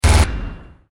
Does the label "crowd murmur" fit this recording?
No